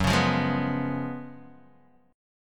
Gb Major 7th Suspended 2nd Suspended 4th, Guitar Open D; chordshape; down